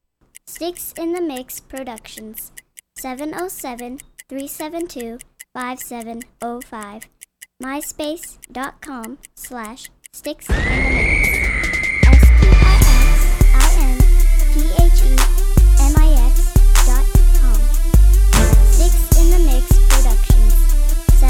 crunk legit

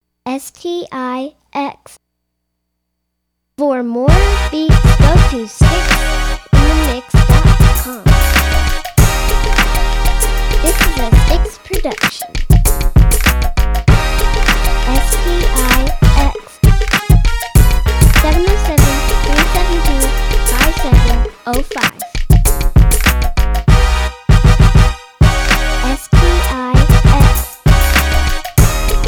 rock on sax

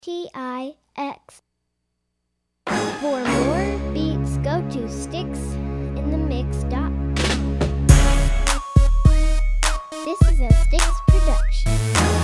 crunk

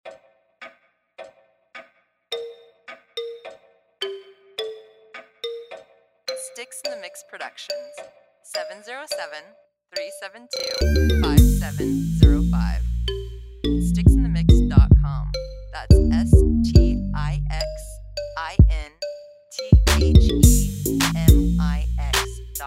Xylophone

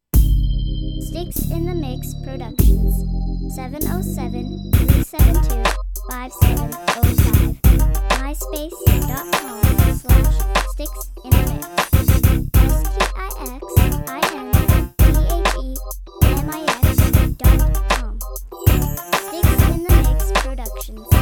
organs rule

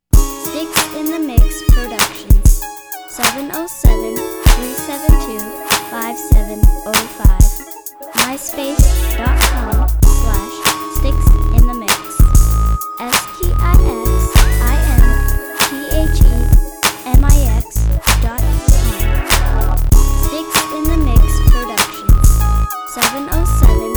uptempo mob

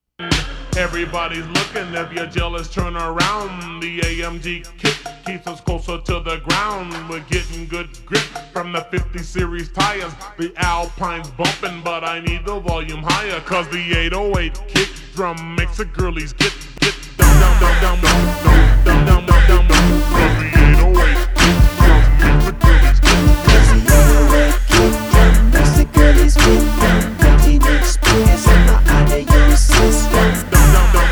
808 kick drum

